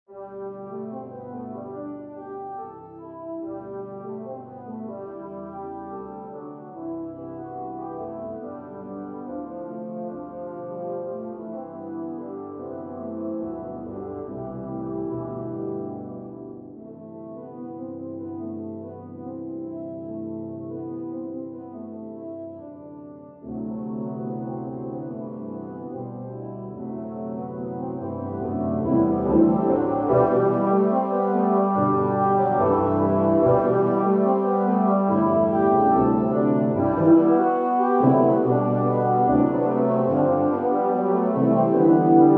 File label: Walking Tune Low Brass; Grainger